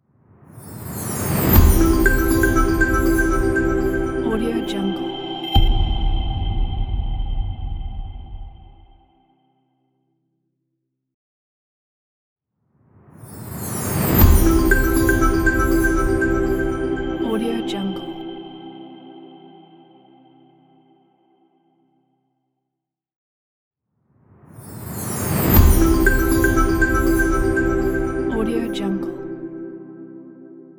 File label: simple logo